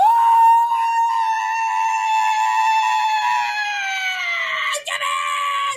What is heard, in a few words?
screams; like sr pelo